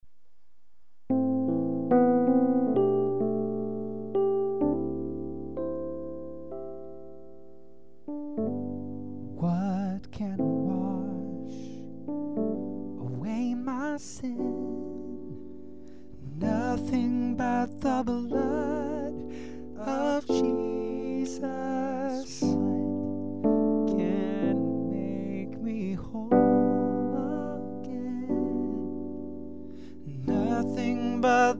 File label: Verse Tenor, vocal part audio; Nothing but the Blood